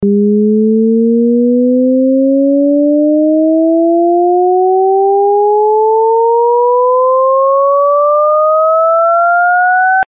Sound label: Pitch Sweep Lo 2 Hi; A Description of Sound and Its Qualities